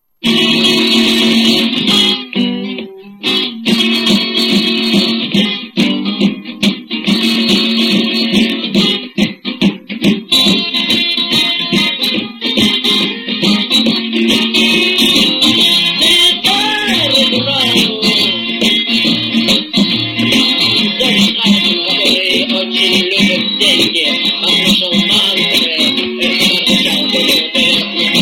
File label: Sitar